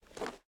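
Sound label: footstep armor; Smite; god Kuzenbo v2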